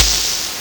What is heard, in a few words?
LSDj, Nintendo Gameboy; 300 Drum Machines